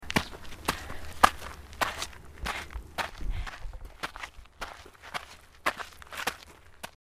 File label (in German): gehen im kies